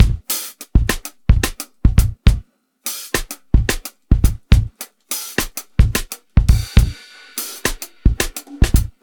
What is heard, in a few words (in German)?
XLN Audio Life Ausgangs Beat